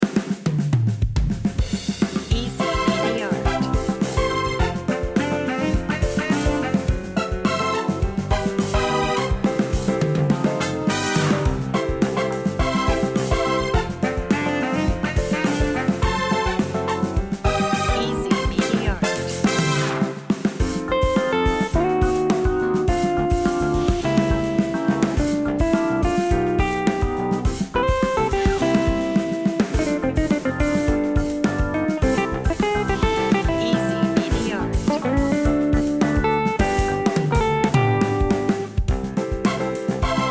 rhythmic jazz

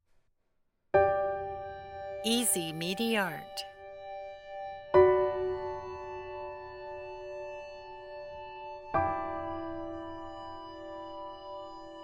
emotional cinematic with vocal

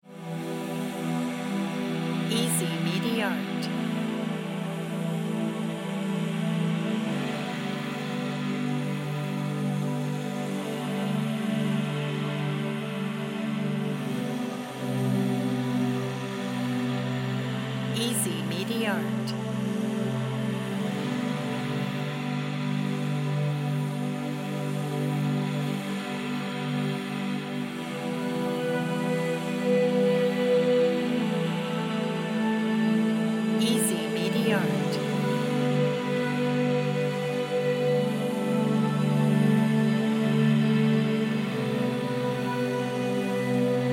drammatic moment background

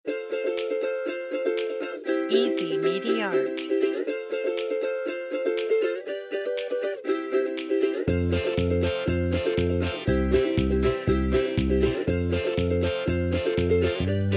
children ukulele

